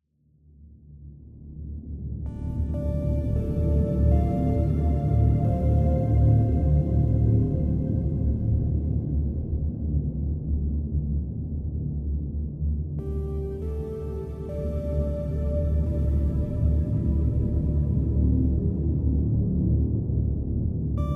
ambient

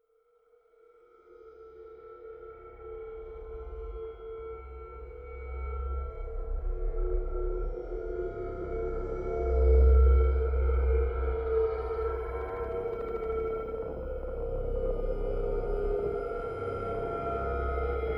pads only

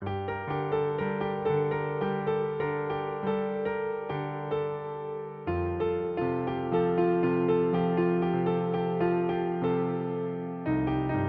piano music atmosphere

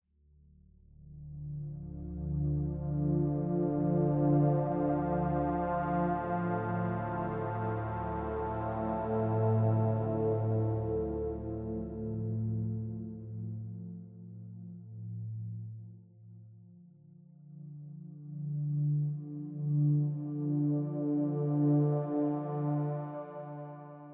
ambient

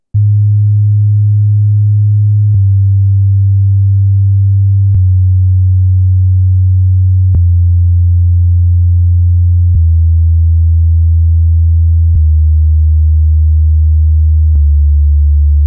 bass scale

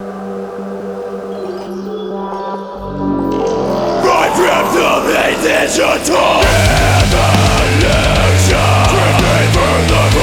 drone

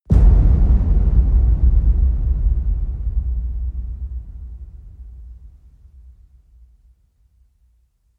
hit low; brand x music

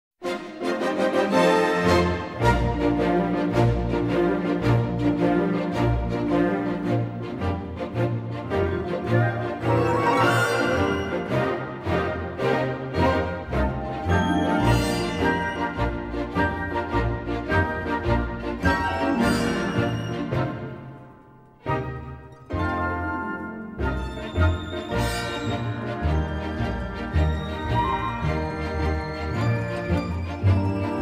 instrumental version

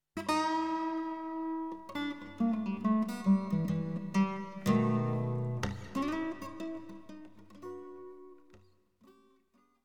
guitar

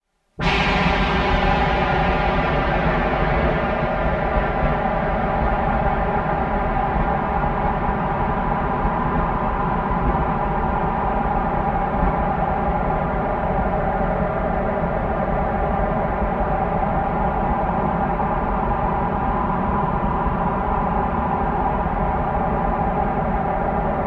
launch 3rd stage sound fx; alan howarth; nell cave

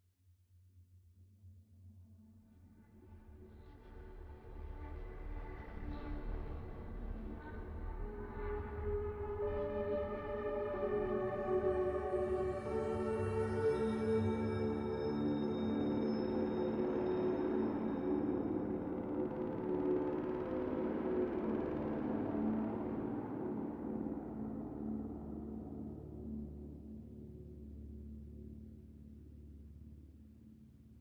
ambient

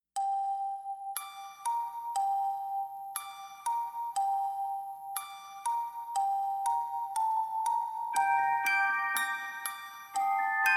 no choir

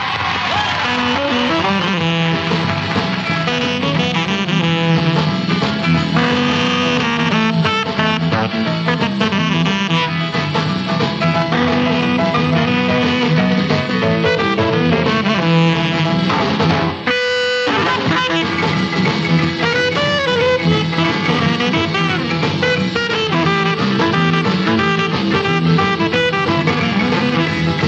instrumental by the band

